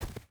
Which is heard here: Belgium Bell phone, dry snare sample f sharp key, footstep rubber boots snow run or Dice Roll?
footstep rubber boots snow run